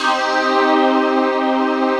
HOUCEY CHORD

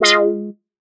stab skinny, stabs

